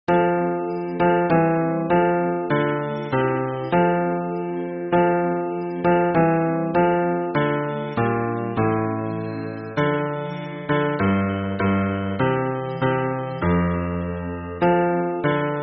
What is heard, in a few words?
hymn bass